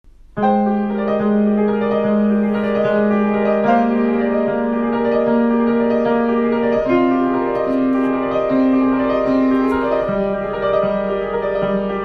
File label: Piano piece